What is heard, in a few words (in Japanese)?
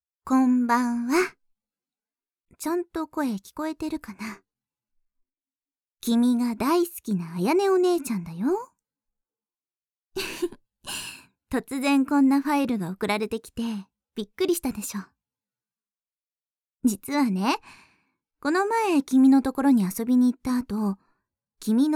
耳舐め音声 効果音なし, ASMR; 君の為に収録した耳舐め音声